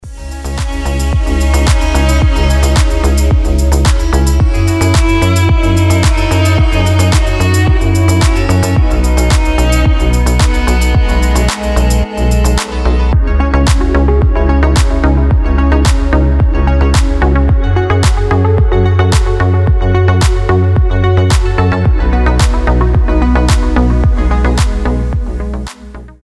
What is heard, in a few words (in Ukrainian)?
Магічна музика